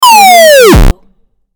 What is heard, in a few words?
8bit platform fall drop